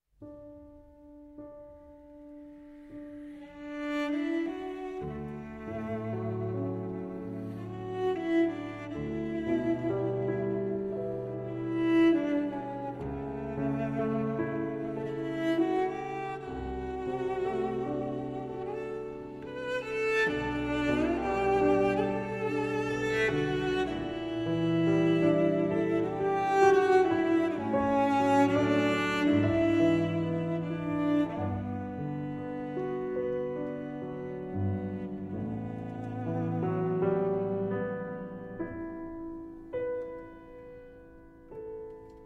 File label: A Piece For Cello And Piano; The Legend Of 1900